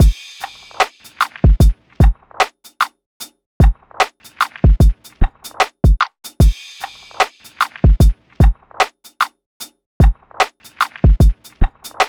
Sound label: Drum Loop, 75 BPM; Cymatics; Eternity Sample Pack; Full Drum Loops